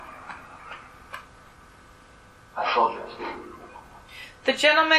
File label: Phasma; Bedroom; private residence freedom road apartment investigation return